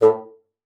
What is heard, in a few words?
bassoon, A2; Xsample VOL-6